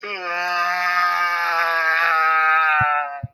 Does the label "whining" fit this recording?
Yes